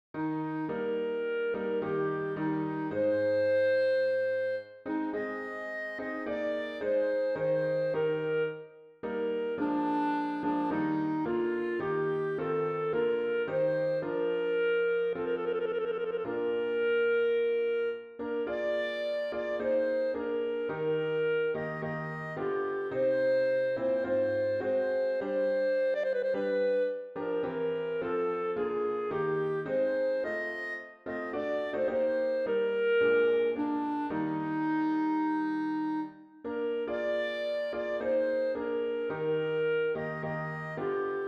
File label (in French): hymne national danemark; kra yer hans ernst